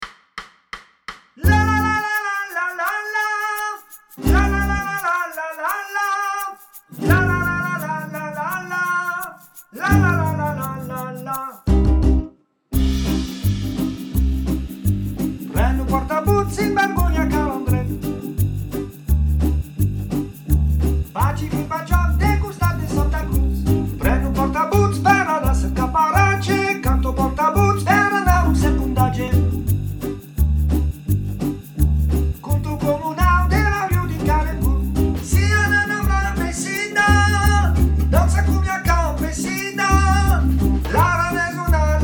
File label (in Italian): Soprani, chorale